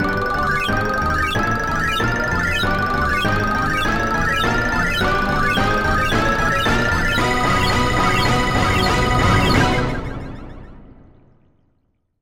Bonus Coin; Tech48

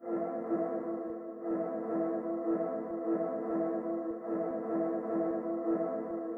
Black Space C - Ambient

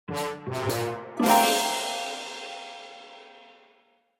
Sting Taunt; hero academy; android

